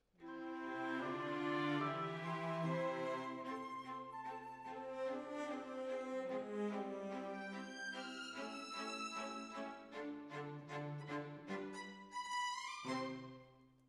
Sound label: Decca, Jazz for piano